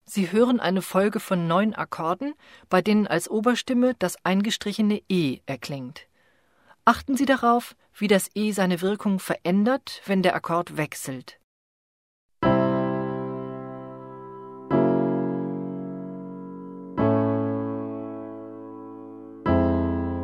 1-ton-melodie zu harmoniewechsel

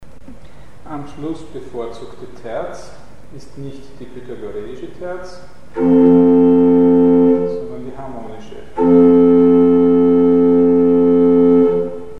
Schlusswirkung pythagoreische vs harmonische Terz; Mikrobuch

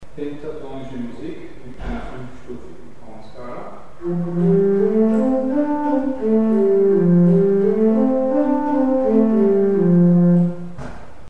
pentatonisch; Mikrobuch

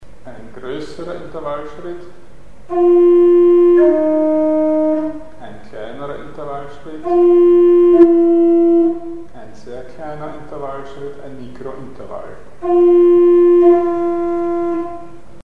Mikrointervall; Mikrobuch

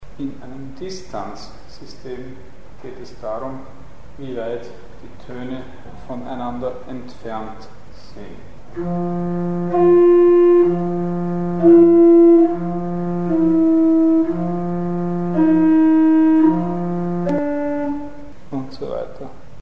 Distanzsysteme; Mikrobuch

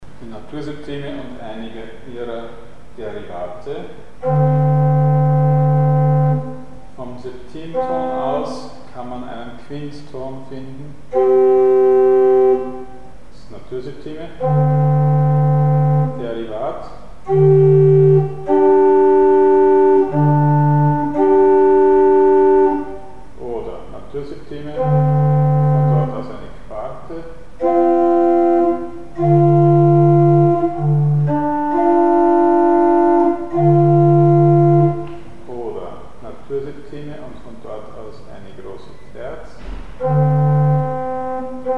Naturseptime und einige ihrer mikrotonalen Derivate; Mikrobuch

